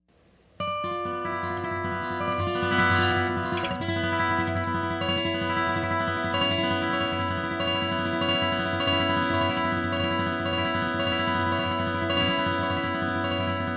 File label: Instrumental